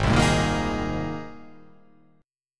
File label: Ab Major Add 9th, Abadd9 chord; Abadd9 strummed; JGuitar